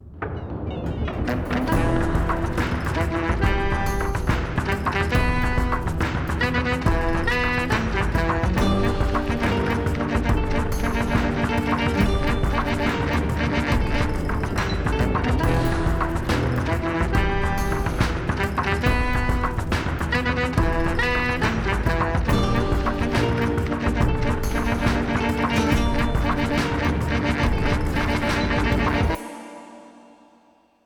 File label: Mystic Forest Action 140